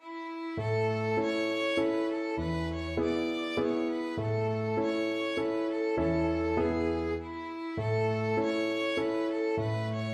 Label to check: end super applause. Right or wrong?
Wrong